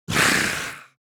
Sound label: Animal creature monster death